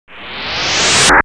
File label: spc stab, space, battles; KABOOM! - Wild and Wacky Sound Software